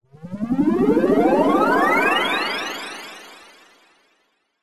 cartoon rise upwards futuristic; zapsplat

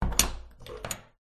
household door metal latch close

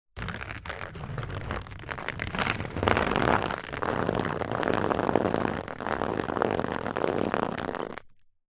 nature water pour trickle drip onto paper; zapsplat

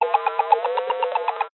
science fiction transmit error burst; zapsplat

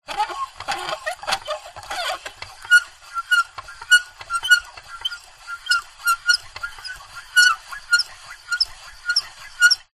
plastic handle turn squeak; foley

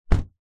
vehicles car door close internal; zapsplat

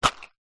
box card small safety pins pick up; household

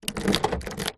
vehicles car key insert door lock unlock remove; zapsplat

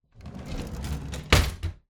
Drawer Kitchen Cutlery Drawer Close

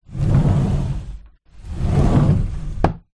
household door sliding wooden open close

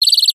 cartoon bird chirp

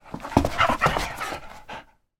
animal dog playful carpet floor

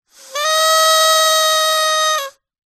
leisure party whistle squawker; zapsplat

